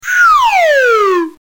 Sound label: Slide